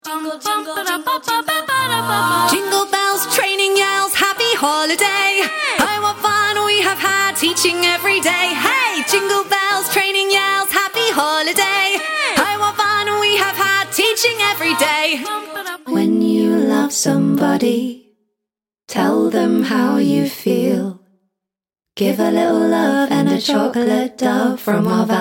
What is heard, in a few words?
jingles